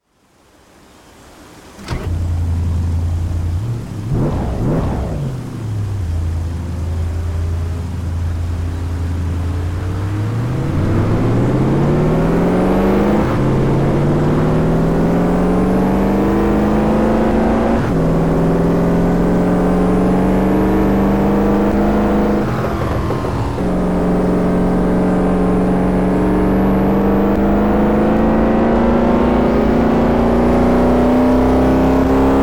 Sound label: audi rs5